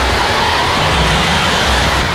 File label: critters; Q Up Arts; Dream Experience